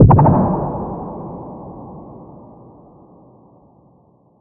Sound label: playful, animation